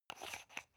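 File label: toothpaste open hard, Bathroom; haus-garten; badezimmer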